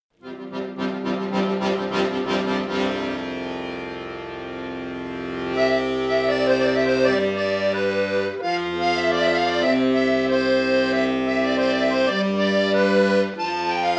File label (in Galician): acordeon